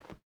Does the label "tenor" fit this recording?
No